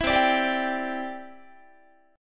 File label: C Major Add 9th, C, down, Guitar; strummed; JGuitar